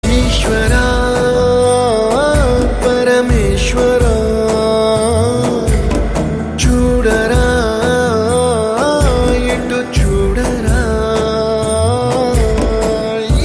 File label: Ringtone; Telugu Private Songs; Eswara